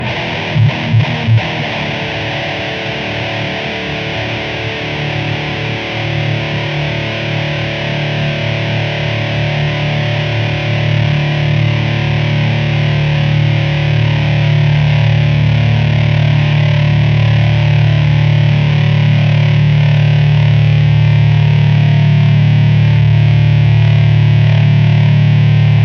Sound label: sustain